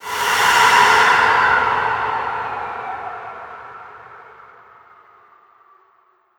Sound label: Dark Magus Impair Wind